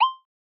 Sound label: Hover, retro rpg menu sounds; leohpaz